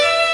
violin; osu

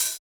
soft hit finish

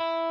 Arp

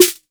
snare; osu

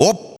soft hit normal

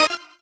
normal hit normal

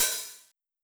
Hat Synth

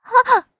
combo break; osu

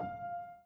Piano Chord

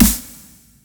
end of stream snare

